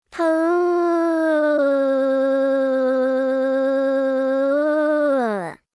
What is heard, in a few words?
cute purr